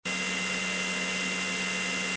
hand scanner; Soldier Of Fortune II Double Helix; XBOX

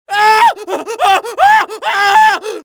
scream, pain death, male; Soldier Of Fortune II Double Helix; XBOX

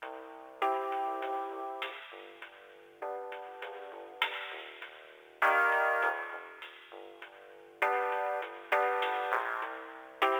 music elevator; TyTheTasmanianTiger3; XBOX

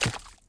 med creature fs puddle, footsteps

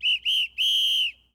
Half Time Whistle; Championship Manager 2006; XBOX

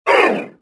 pain; galak

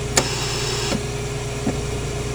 ventilator, hospital; ambience; Soldier Of Fortune II Double Helix; XBOX

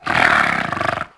Growl; Alberto; Dino

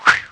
missile

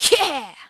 GRUNT, VOICE; Pulse Racer

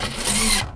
droid servo

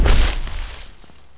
Large walk, Dino

